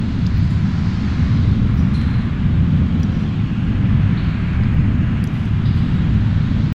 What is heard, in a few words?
SFX loop; Disney's Dinosaur